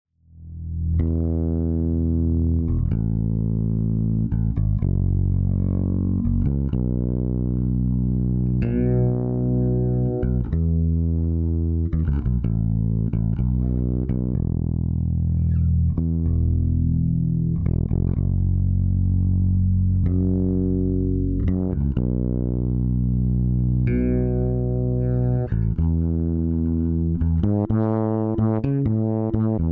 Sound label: bass with